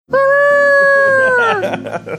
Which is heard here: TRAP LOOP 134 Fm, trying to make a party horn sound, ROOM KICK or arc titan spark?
trying to make a party horn sound